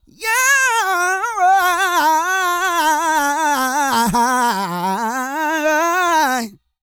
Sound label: GOSPEL